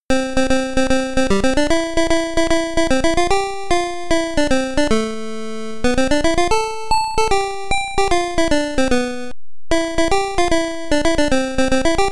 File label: Game Start; Champion Baseball; SC-3000